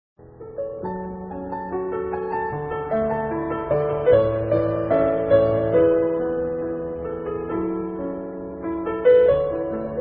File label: piano tone ringtone; android